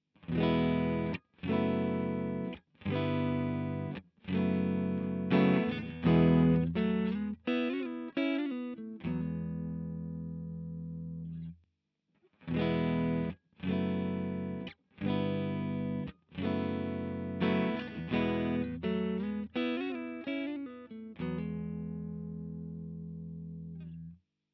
Teletronix LA-2A Regler 12 Uhr Mix 100; UAFX

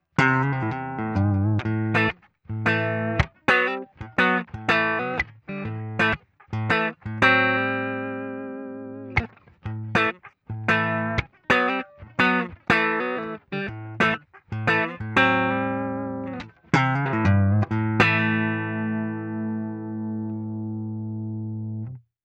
Soundvergleich Neck Pickup Cort X700

